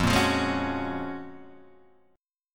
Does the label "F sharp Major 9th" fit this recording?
Yes